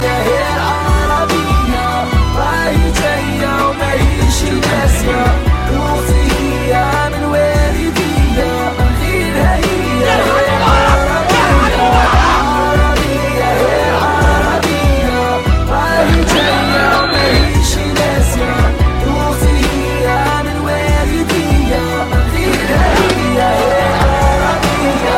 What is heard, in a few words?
Arabia; meloboom